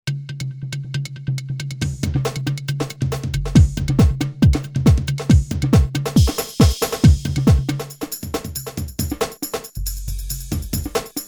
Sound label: jungle